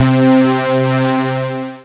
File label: Synths Root C